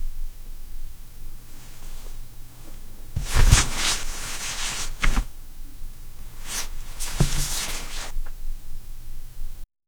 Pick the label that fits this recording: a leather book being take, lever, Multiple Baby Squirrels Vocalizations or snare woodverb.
a leather book being take